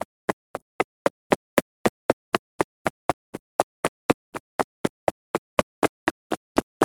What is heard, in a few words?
running on road